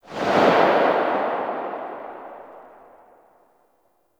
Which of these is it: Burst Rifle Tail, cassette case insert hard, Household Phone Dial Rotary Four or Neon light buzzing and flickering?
Burst Rifle Tail